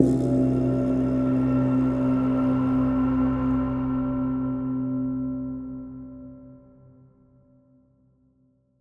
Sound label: Cave Ambient; song of iron